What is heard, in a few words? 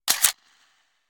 menu flip